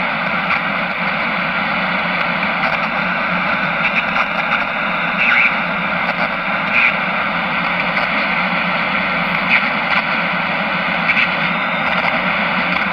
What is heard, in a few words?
static; Thalassophobia Restored